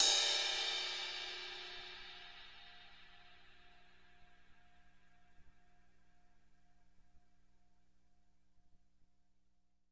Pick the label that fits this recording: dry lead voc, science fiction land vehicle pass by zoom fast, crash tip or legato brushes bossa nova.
crash tip